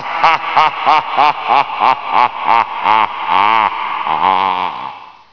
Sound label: haha